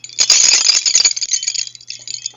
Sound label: macrame choker; Color Cord Tribal Choker with Coins